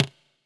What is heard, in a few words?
Toms, Elektron MachineDrum SPS1 MKII